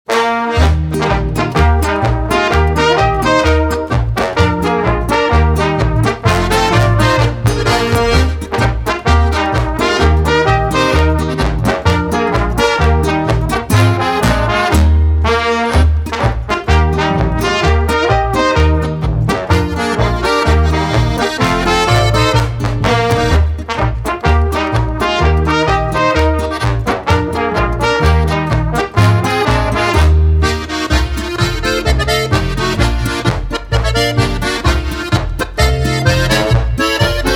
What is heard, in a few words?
Polka